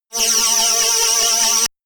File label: shot annoying buzzing tooth, zaps buzzes